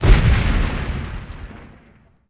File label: Grenade Exlpode; Civ4 warlords